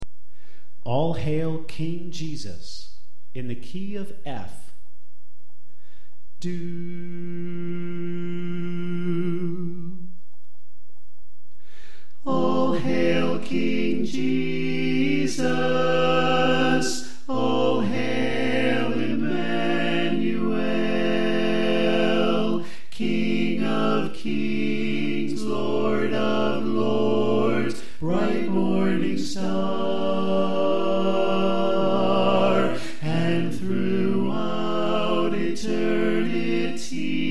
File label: Bass Left